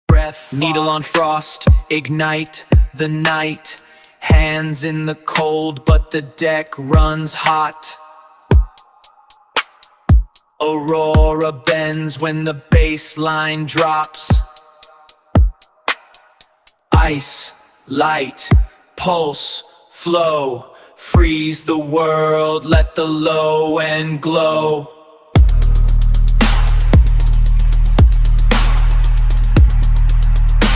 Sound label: bass drop; aurora borealis; google lyria 3 music generation